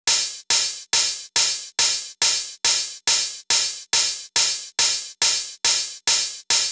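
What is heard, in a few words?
drum Loop 140BPM overhead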